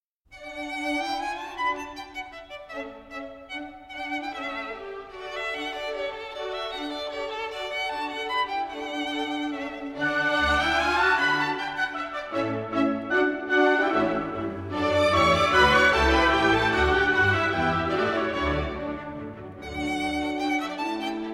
Violin Concerto No. 2 in D Major, Rondeau. Allegro; Mozart; K. 211